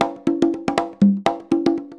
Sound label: 120 CONGAS, Percussion Loops